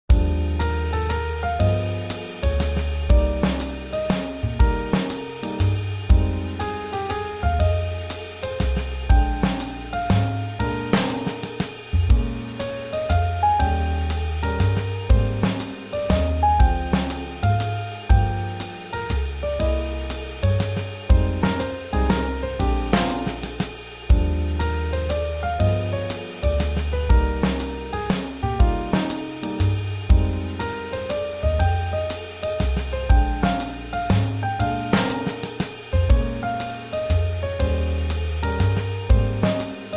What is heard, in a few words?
Swing Jazz